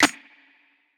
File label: snare; dough-samples; eddyflux